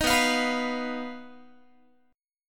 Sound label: B Major Flat 5th B Guitar; strummed